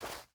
footstep burlap snow run; Rust

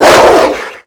animal dog bark

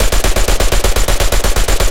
loop m1919 origin, guns tanks

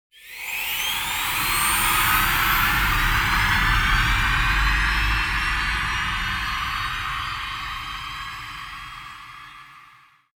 Aircrafts L-39 AI25 Start; DCS

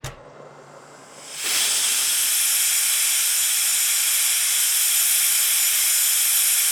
Aircrafts Su-27 Canopy Open; DCS

